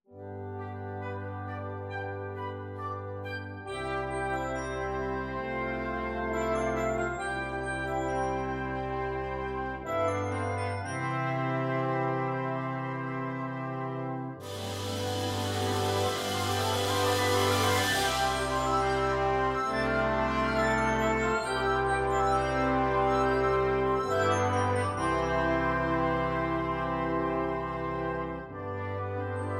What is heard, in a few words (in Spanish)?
para flauta, oboe, clarinete, saxofón alto, trompa, 2 bombardinos, tuba, lira y plato suspendido; Fernando Bonete Piqueras